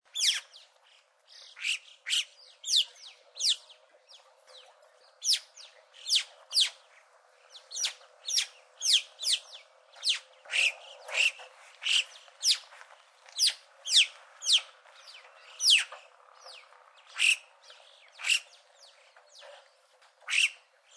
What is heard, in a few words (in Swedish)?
Alpkaja